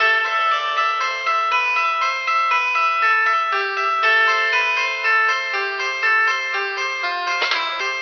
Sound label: keys harpsy